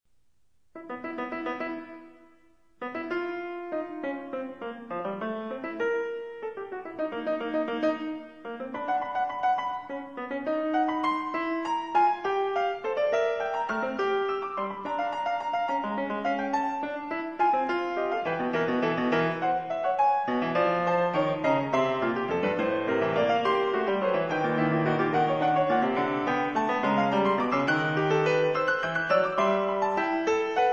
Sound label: sviridov fugue